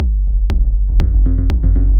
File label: Dark 120 Bpm